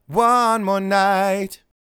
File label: Male Vocals